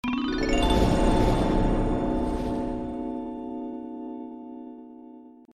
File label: magic